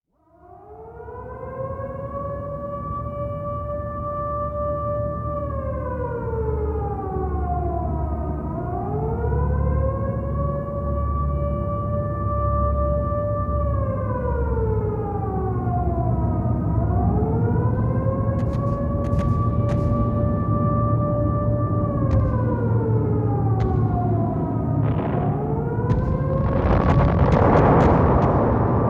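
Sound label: Pommitus Ilmahälytys Bombing Air Raid Alarm Mix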